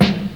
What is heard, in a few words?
classic tight low end hip hop acoustic snare sample g key